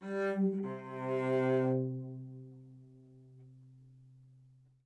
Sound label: live string phrases, string phrase Cmaj 100bpm; bespoke - Floral v.1